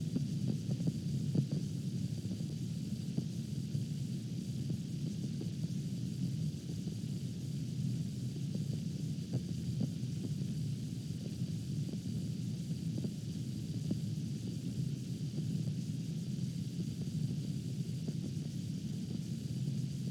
Brown Noise

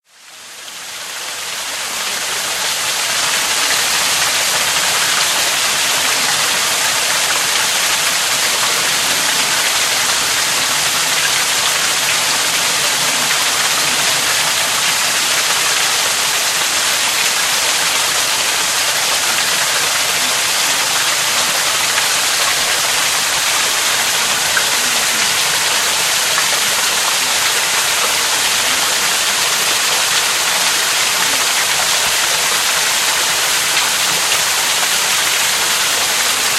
Surroundings Cascade

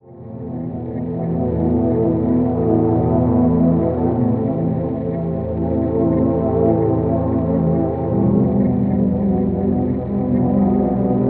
chords 60

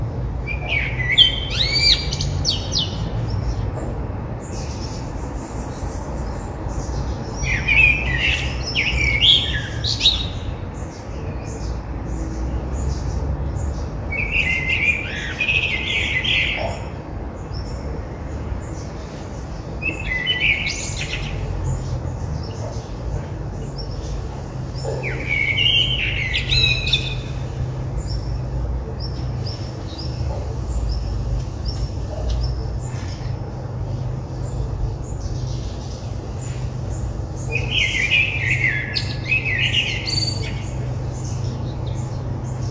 sun ambiance

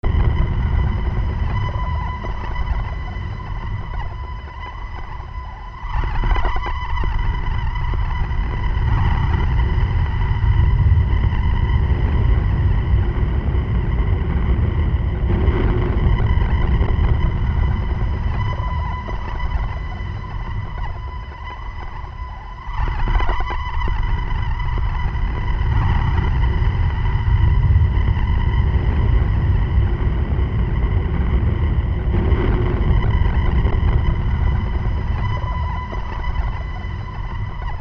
atmos

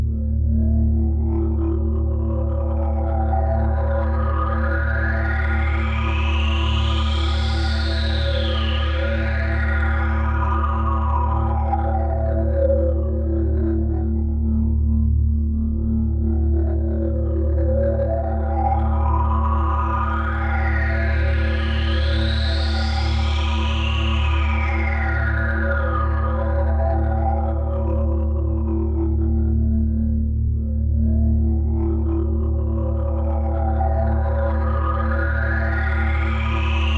portal

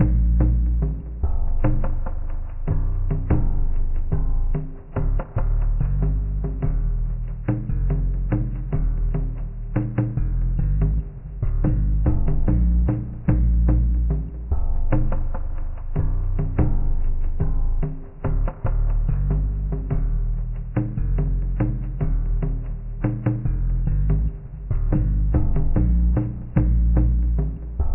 Bgm c bass

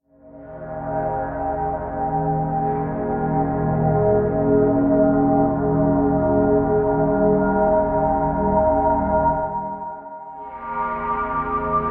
Drone slow

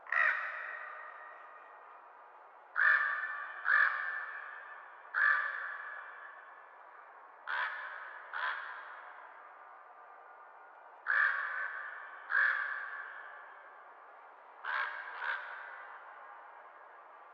crows

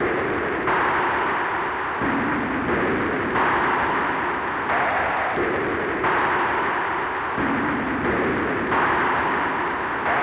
bgm farm

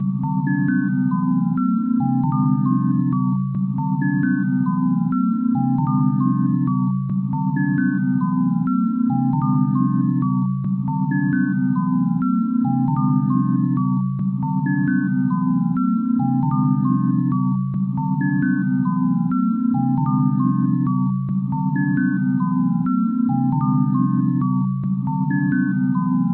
Bgm

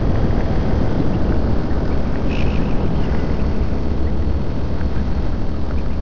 bgm

